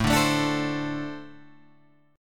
A-Minor Double Flat 5th A Guitar Open G

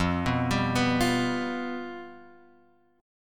F Suspended 2nd Flat 5th, down, Guitar, Open G

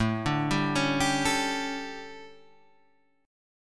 D Major 7th Suspended 4th, chord

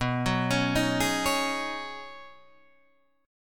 B 6th Add 9th B Guitar Open D, chord

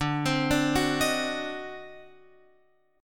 D 7th Flat 9th D down Guitar Open F; D7b9; arpeggiated

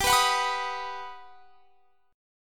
G Augmented Major 7th; strummed

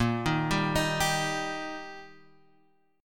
G Minor Sharp 5th Bb, chord

